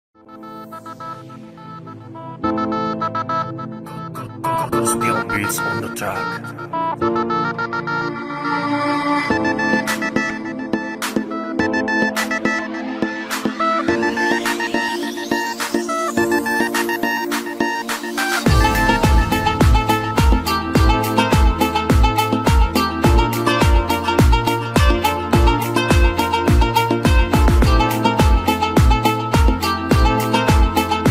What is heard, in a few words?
car music